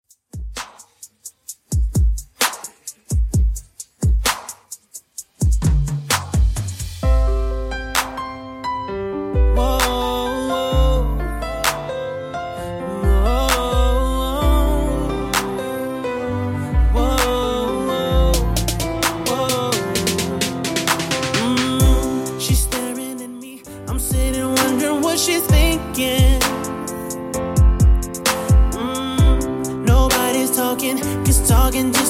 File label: 2ks RnB Redrum 65bpm